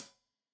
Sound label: Hat Tight, Hi-Hat, Cymbals; Deeply Sampled Drums